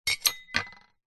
trowel metal set down on tiled ground; industrial

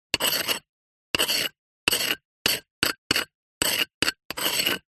Handwriting ThickChisel Stone Medium; D&H; soundbox library

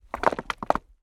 foley large rock roll move beach rockpool

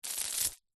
industrial chain medium drop to ground; zapsplat

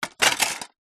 plastic shards broken thin pieces set down; foley

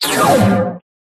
tuner tucked; Gregor Quendel

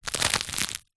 food bread baguette dense crispy rip tear in half

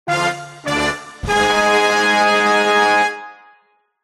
cartoon villain motif

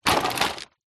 transport bicycle drop impact on wheels

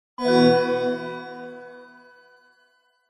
game sound tone magical

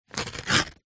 foley paper rip

